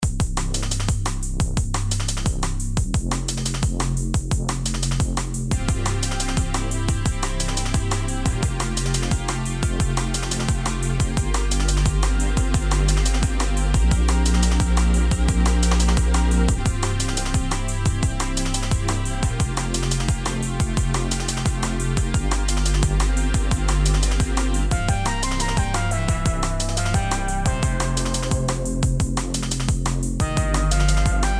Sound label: Techsteppin 175; Imaginando BAM Seaside